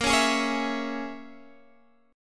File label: Bb Minor 7th Flat 5th, Guitar, Standard; Bbm7b5; strummed; x,13,11,13,14,12; JGuitar